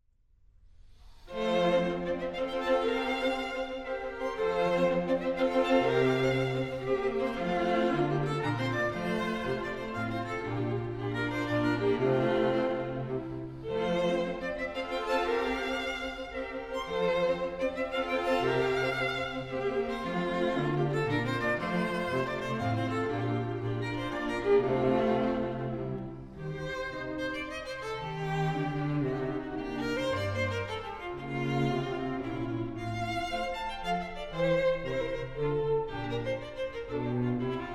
Two original movements from string quintet in Bb KV174 - Trio; Mozart; St Martin in the Field's Chamber Ensemble